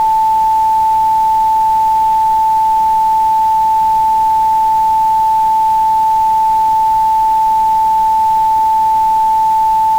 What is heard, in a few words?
880Hz minus8 plus pink, noise